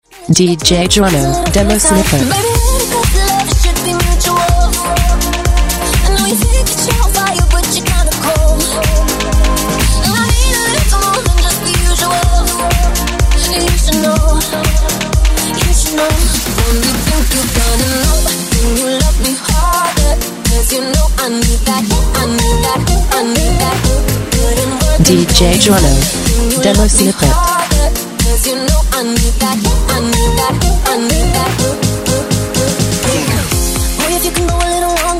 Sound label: Hype Mix